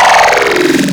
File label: Growl Table, Serum; Cymatics